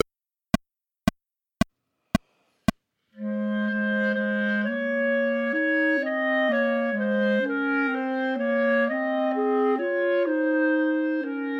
Old English, Chamber Music for 3 Clarinets, minus 2nd part